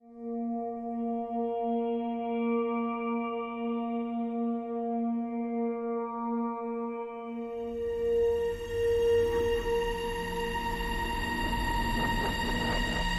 Drone, Light

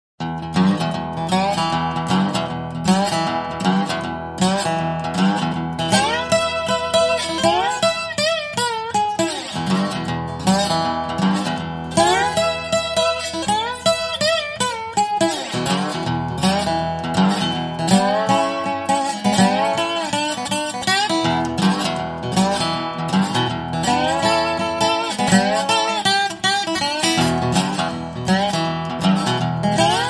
Bottleneck Solo

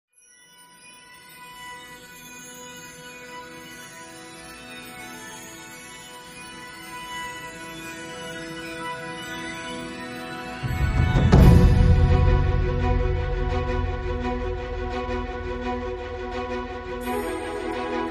No Glockenspiel No Harp

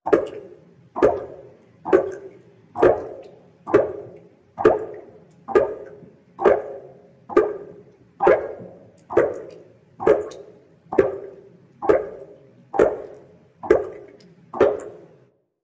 Blood Drips; Red Dawn

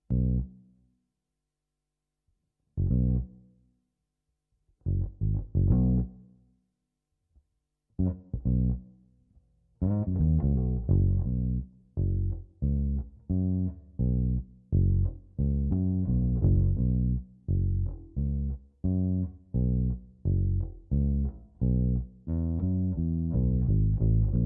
Bass Clean

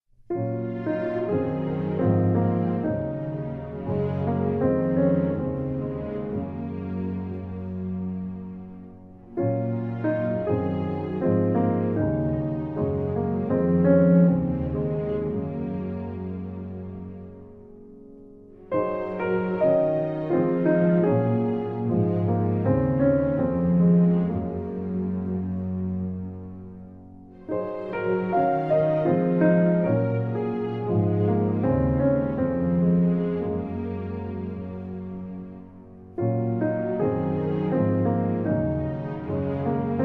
With Strings

